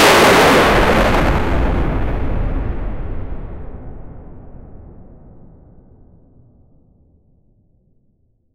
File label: a massive landslide is ha, calamity